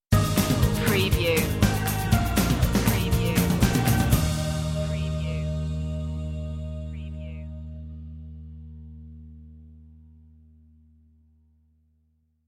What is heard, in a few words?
stinger